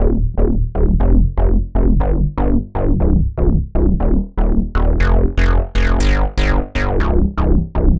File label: Mooger Bass, Bass Foundations; Sonic Foundry (Sony Creative Software); The Electronic Music Manuscript Collection